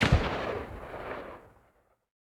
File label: bullethit far; War Thunder